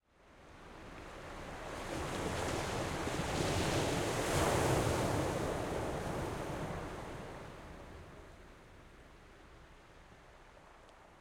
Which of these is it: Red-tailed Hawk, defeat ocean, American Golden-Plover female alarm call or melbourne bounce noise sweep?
defeat ocean